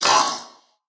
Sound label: Wrong